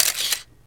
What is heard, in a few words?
Shutter; LG L600i